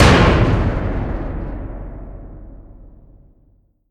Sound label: Vapor Miner Hopper Hit; moul